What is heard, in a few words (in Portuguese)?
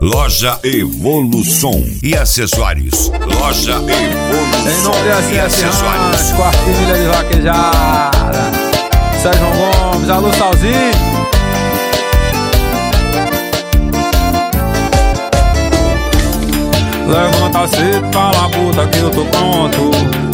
sertanejo; loja evolusom